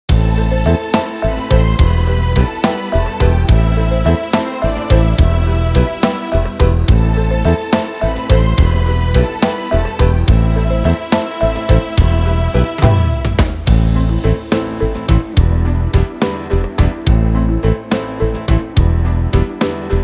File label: Waltz